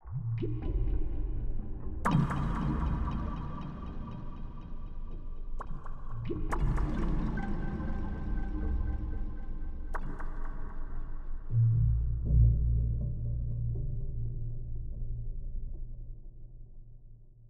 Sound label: Suspense Bubbles, cinematic soundbeds; musicradar